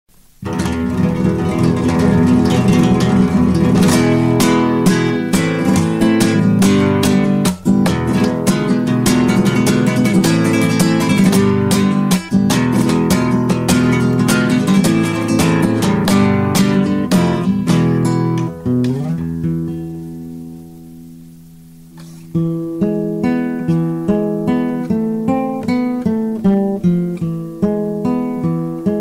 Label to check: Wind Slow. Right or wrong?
Wrong